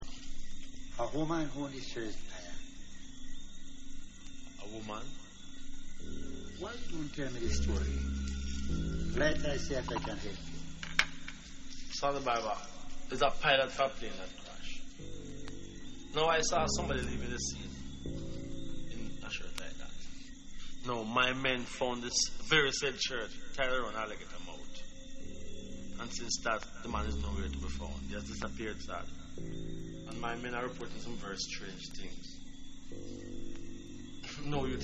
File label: Dub; countryman o s t